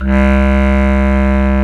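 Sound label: bass clarinet, Orchestral Winds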